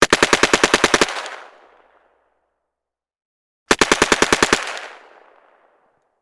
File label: gun carl gustav m45 swedish k; still north media